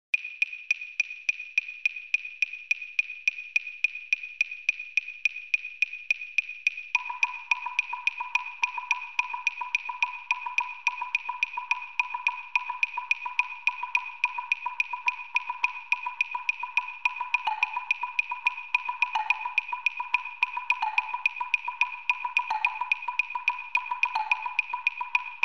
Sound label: Music for Pieces of Wood